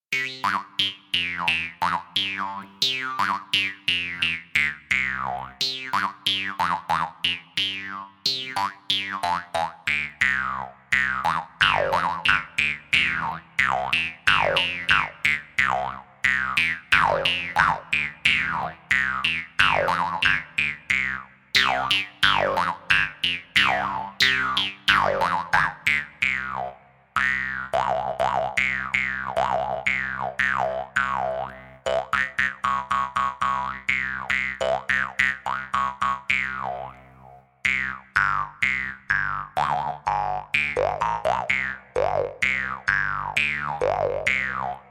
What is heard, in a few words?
drum; sampler